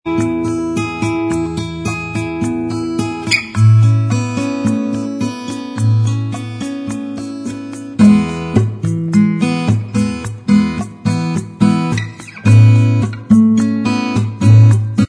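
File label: acoustic instrumental track, backing tracks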